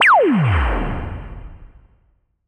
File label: Blip Impact; One Shots; FX; Ableton Live 12 Lite; Core Library